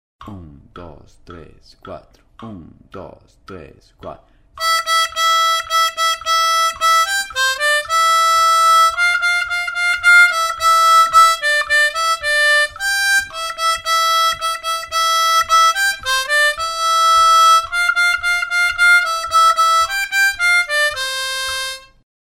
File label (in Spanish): JINGLE BELLS, armonica